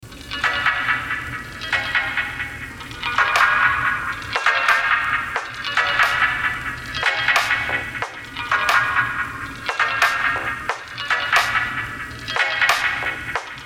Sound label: circuit beat